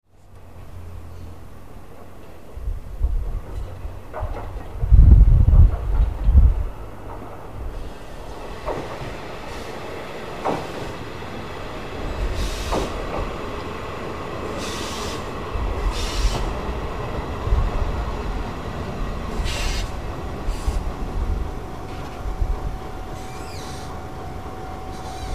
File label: kiha603; kiigobou